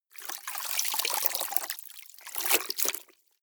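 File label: clothes water up down long, household; haus-garten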